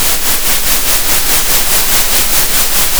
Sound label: center, Sound of a train